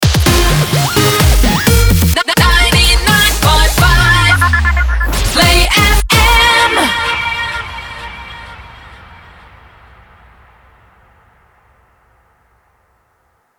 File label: 128bpm Bbmin MIX Shotgun